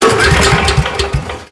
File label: generator no start loop; ambient